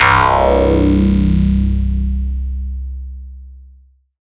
bass terror C

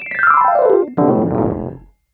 Chord Stab

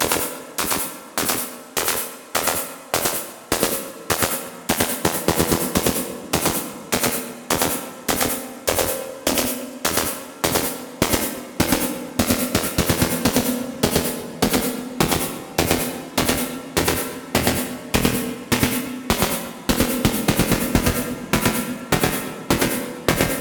Chord Loop 128bpm Emin

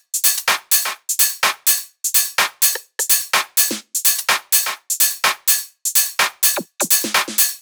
126 Drum Top

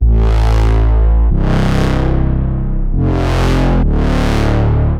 144 bass loop sting Amin; VINCENT

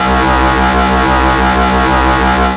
Hard Bass, C

